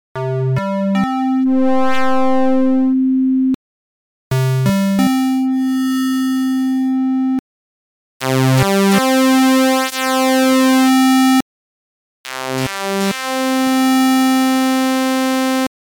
VA Waves

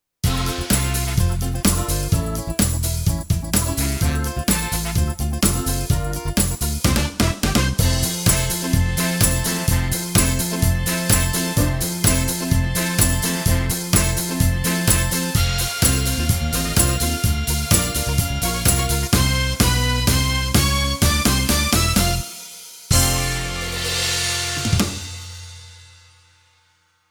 FP-50 Rhythm Soul

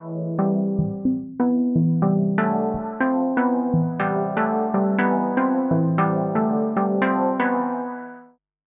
Wavetable Hang Drum; Ableton; Festival

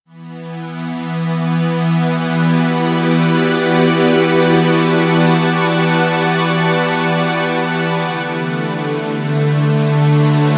Roland AX Synth 5th Pad

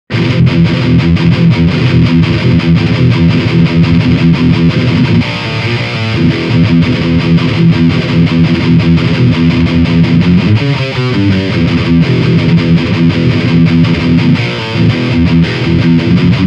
Lead Channel Brutalo Riff

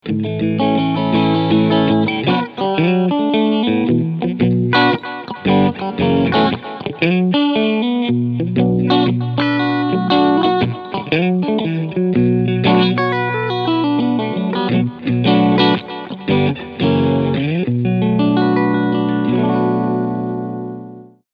Clean Position

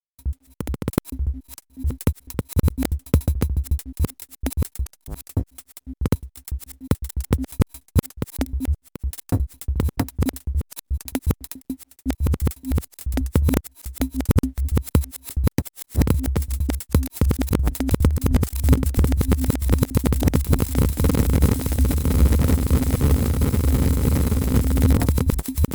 Drums Drops; TastyChips GR-1; Drums2Drops

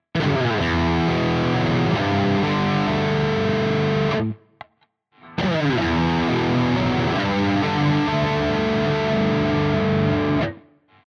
AxisFlanger lead bypass

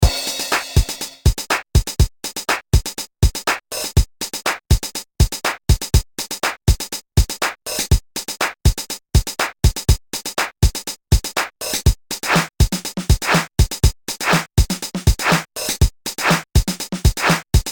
TOM Intern

